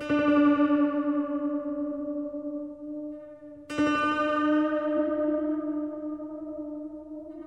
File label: guitar shivers, Sad; Loops